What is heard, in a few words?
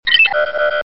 error beep